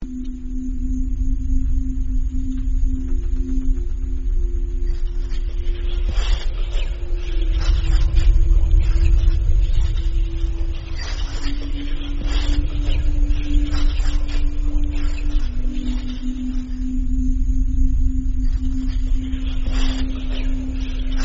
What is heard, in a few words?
whistle; good bad ugly